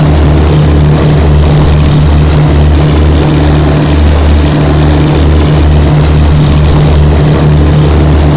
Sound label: avro lancaster rear, engines; War Thunder